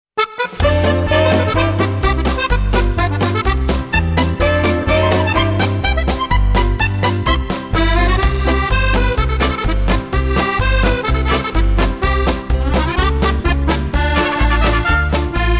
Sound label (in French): accordeon; mon hobby c'est l'accordeon